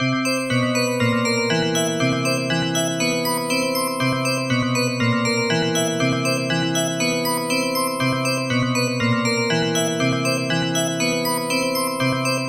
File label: Bell Ringtone